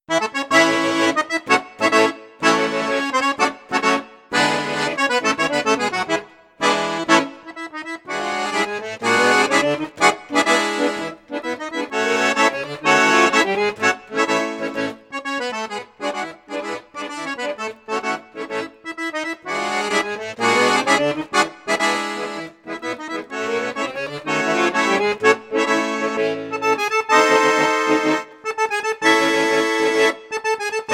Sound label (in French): registre basson flute juste flute haute piccolo, accordeon; crucianelli super king